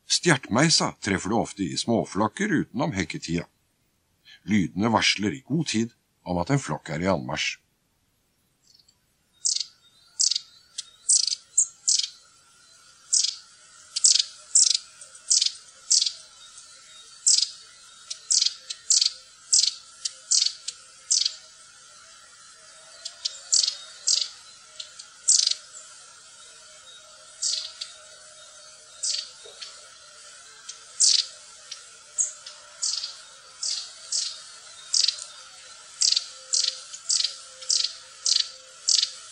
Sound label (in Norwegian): stjertmeis